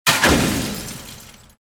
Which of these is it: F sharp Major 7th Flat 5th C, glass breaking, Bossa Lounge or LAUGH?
glass breaking